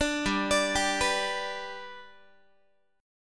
G Major G down Guitar 4A 4E 5A 5D 5G sharp 6C sharp; arpeggiated